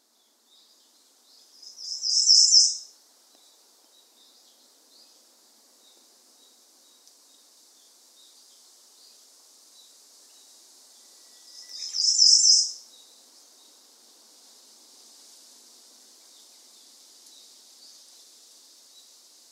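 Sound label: Ijima's leaf warbler, miyake; iijima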